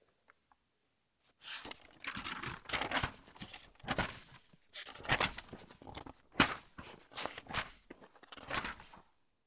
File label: Turning Page Fast